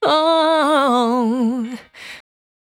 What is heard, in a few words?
Cry 68bpm B, Vocal; Zero-G